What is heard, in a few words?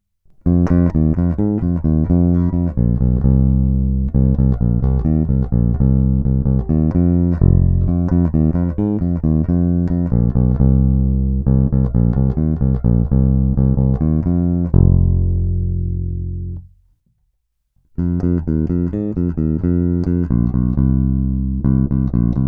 Greco TB-750 Thunderbird Bass